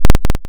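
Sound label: ring modulation sqr sin, env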